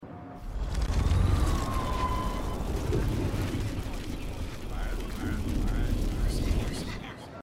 zurueckspulen; life is strange

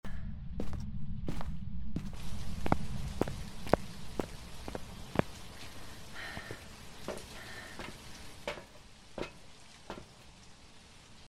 haus verlassen; Phasmophobia; gamesoundopedia

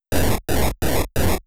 Schritt Wolf; gamesoundopedia

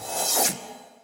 kampf klingenwaffe; magic 2014

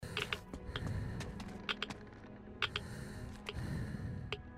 geigerzaehler; Metro 2033; gamesoundopedia

